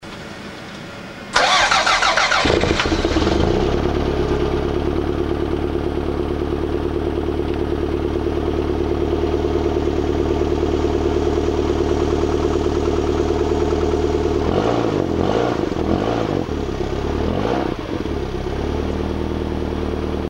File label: standard exhaust cold start